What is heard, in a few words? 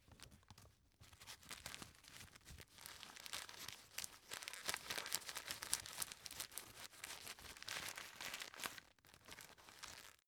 tissue stuff in package